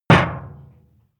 impact soft sheet metal damped; maschinen; soundpool

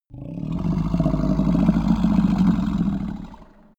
minotaur grunt short; kreaturen

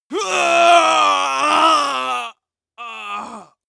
male combat die; schreie; menschen

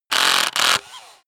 screwdriver on load; maschinen; schrauben drehen hydraulik

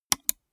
gaming mouse left click single

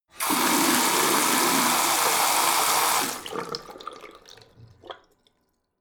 tap flow hard short; haus garten; badezimmer

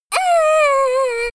little girl cry aah; menschen

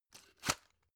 drug put in hard; berufe; arzt

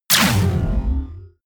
sci-fi plasma cannon fire; schusswaffen

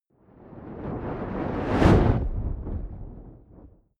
fire whoosh big; swooshes-swishes

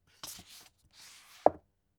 book shelve soft; haus garten; wohnen

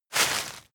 step walk graze leaves; schritte

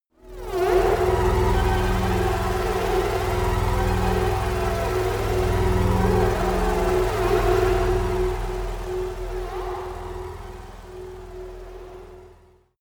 space dangerous energy; raumschiff

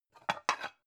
pan take slide short; objekte; topf

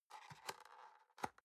box small close soft

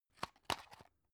drug close; arzt

